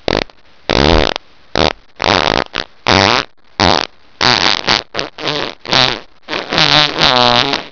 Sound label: Farts